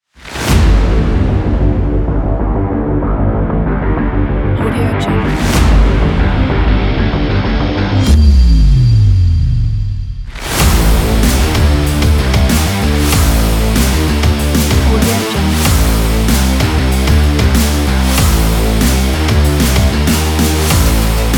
rock trailer

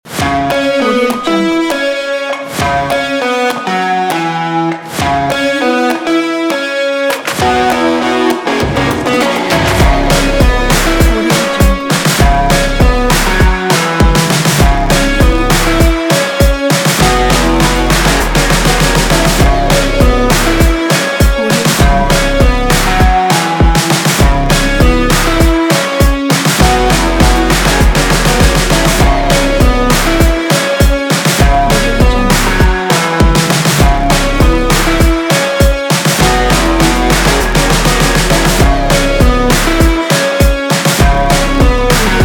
action indie rock

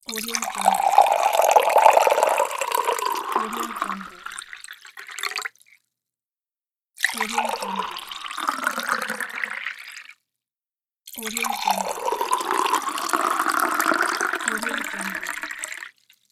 pouring water into glass

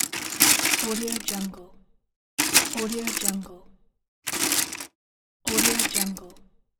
plastic toy pile rummage

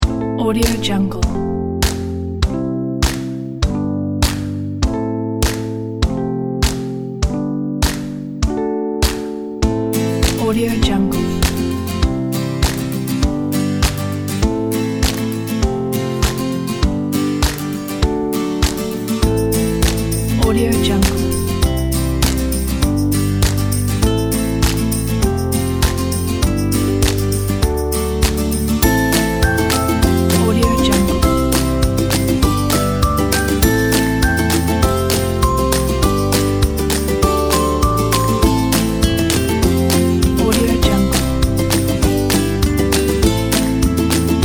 ukulele folk

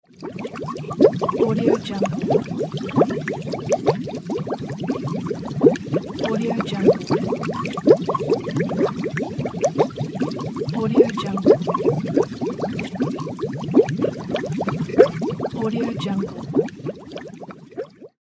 water bubbles

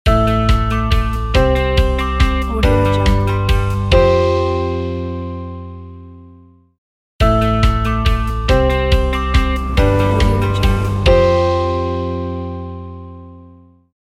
positive logo

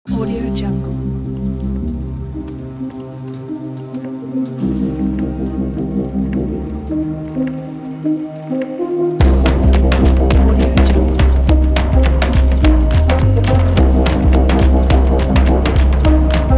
sport hybrid electronic beat; kicktracks

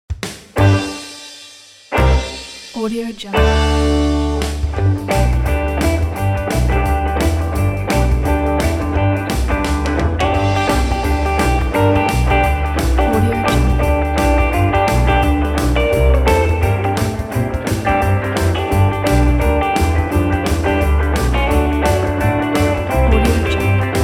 50s rock boogie woogie; applehillstudios

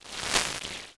foley synthesized electricity soft

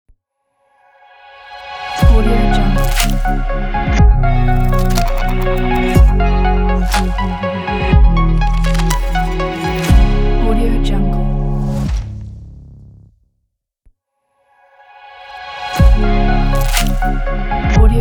modern tech intro logo

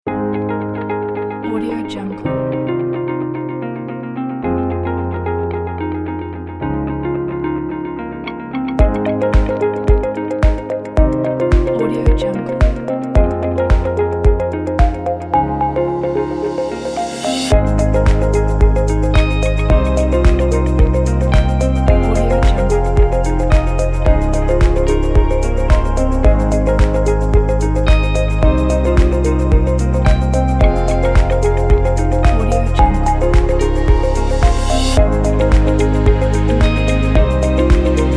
inspiring ambient

